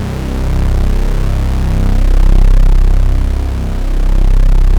MKS BASS

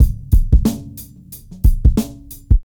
Breakbeat 91bpm; Hip-Hop Street Beats; Sonic Foundry; Hip-Hop Street Beats Construction Kit; Street Beats by Poogie Bell

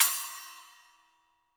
HiHat & Crash, Roland TD-20; HiHats

